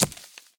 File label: gun miss; Blind Drive